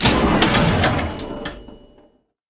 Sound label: mechanic Target End; Toy Story Mania